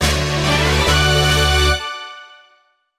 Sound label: Character Join; Paper Mario - The Thousand-Year Door (GC DSP)